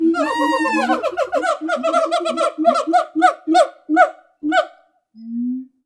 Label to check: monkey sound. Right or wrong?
Right